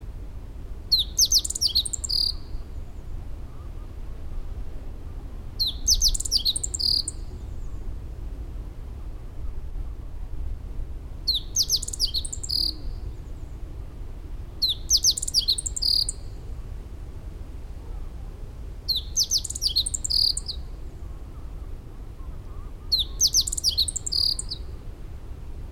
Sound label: bunting meadow Emberiza cioides weigoldi